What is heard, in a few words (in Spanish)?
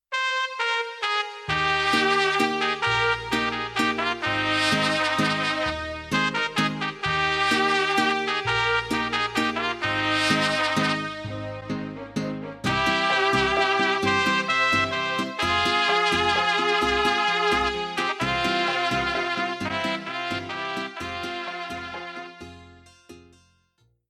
Mariachi Lento, ritmos